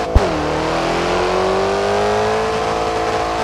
second, vehicles; 15gt

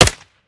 sup; viper

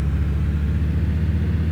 v8 rev short loop

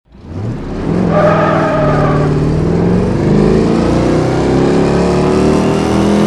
first, vehicles; coupe40

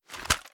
raise first end; gmod; viper

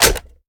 reload empty mag in; reloads; gmod

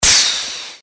shift up; gmod; paris

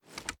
reload empty fast drum tilt; gmod

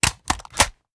raise first stock; reloads; iw8; uzulu; gmod

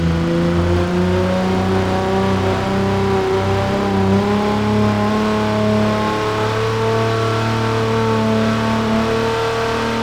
fourth cruise, vehicles; chevrolet impala

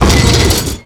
medium crash, vehicles

